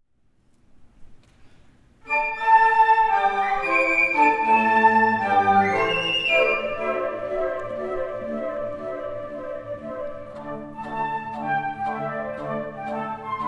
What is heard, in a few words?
Jig; NFA 2018; Knox's Jig